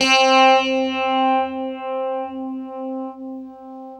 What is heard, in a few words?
UNIVIB C 3, Guitar; East West; Steve Stevens Guitar Samples Collection